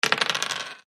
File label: dice roll